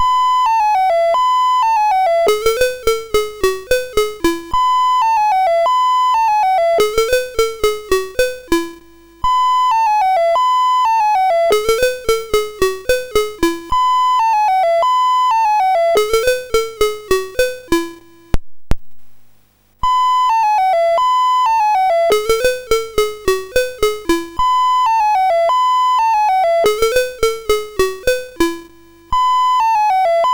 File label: Musette MOS6581R2